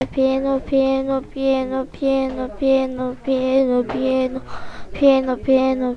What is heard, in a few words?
piano